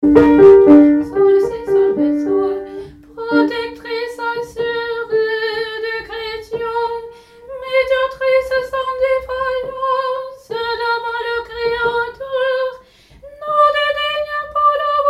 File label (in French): Protectrice assuree Tenor